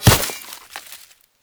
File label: Great Sword Melee Impact Vegetation; Weapon Impacts; Player; Swords; Environment; Founders Great Sword